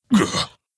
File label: Wound; wotlk; Creature; halion